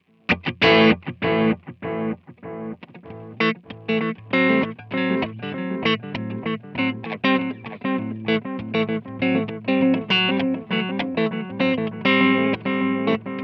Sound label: Real Delay; Harley Benton Dual Effect Duality